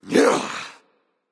grunt attack claw; smite; voicepack; set

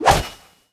auto swing; pirate; Smite; Sobek

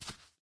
step; smite; god serqet

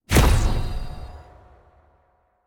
giant fire impact; smite; vamana

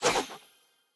banana throw; smite; raijin

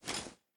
mvt armor; serqet; smite

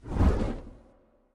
wingflap; smite; thanatos

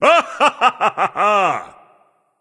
laugh; smite; zeus

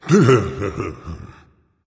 grumbling; smite; voicepack; ymir

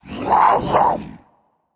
grunt attack, voicepack; smite; jorm

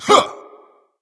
grunt attack; smite; ravana

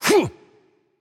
grunt attack; smite; voicepack; lancelot

